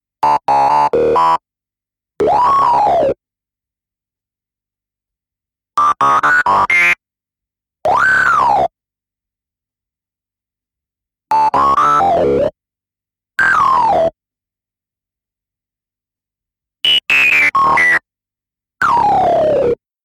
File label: ring